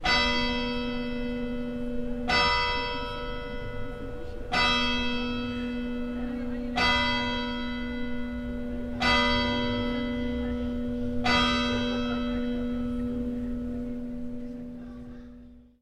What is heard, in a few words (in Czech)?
odbijeni hodin; hronov